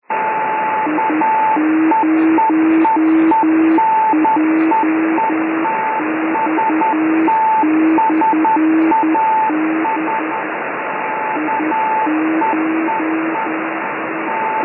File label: beacon